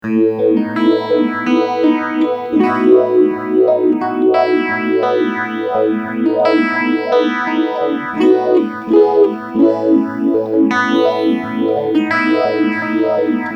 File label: Rotary